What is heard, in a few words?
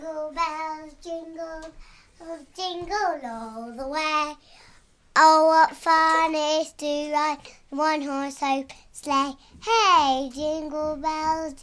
jingle bells by 2yo 3 5yo